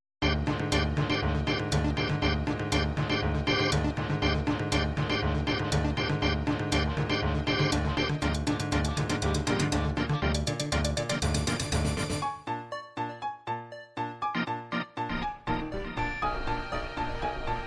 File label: techno techpop